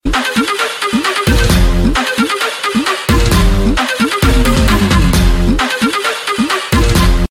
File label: Samsung ring